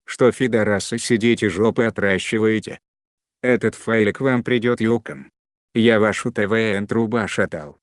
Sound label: truba